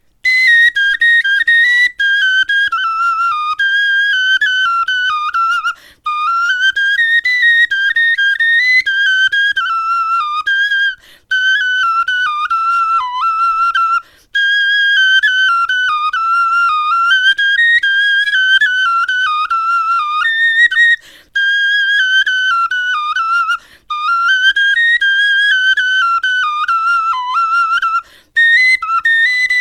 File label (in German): melodie